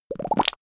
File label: bubbling lips